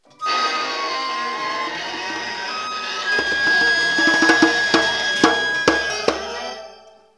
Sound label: alarm call; rehearsal